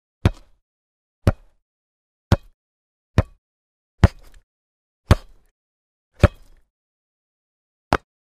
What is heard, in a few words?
Football ball kick